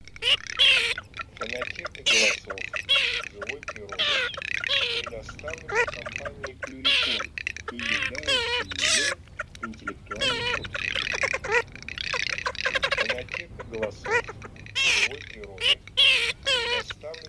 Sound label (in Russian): Чирок-трескунок Marzaiola; plurifon